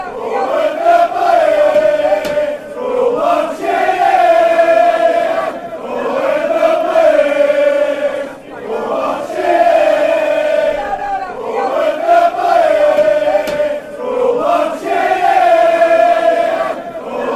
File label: slow version, fanchants; when blades go marching